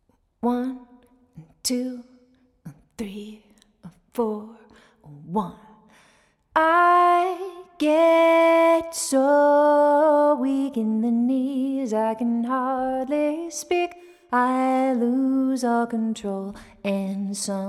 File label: vocal